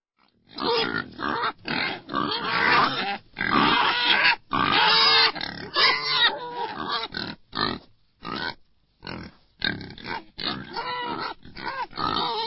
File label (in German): schweinisch